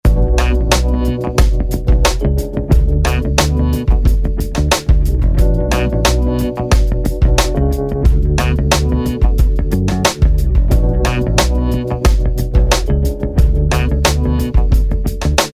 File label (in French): sonnerie